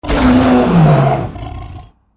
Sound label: deathclaw death; npc